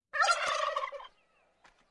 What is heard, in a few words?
turkey gobble